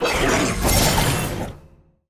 Enemy Attack Spin Cast; bloom minigame

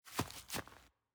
Footstep Grass Rotate

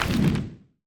Comic UI Button Page Next

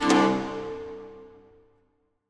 music puzzle note up

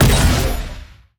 Attack Dash; bloom minigame

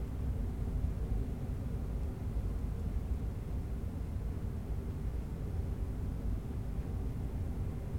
Ambience Hallway

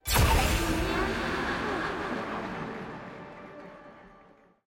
comic portal Open

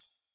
ui page hover; spirit blossom

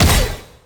Attack Light Pre; bloom minigame

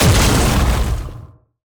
jump land; bloom minigame

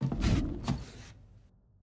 SFX Puzzle Exit; Sevika Map

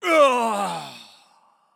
Death; bloom minigame

